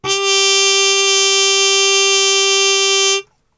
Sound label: G 2, Brass; Best Service; Brass Super Section; TRP HARMUTE; 90 s Sample CDs